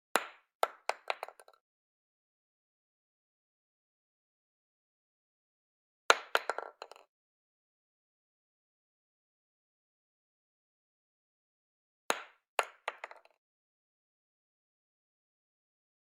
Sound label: 20 Gauge Shotgun Shell Drop On Wood; Hollywood Edge; Heavily Armed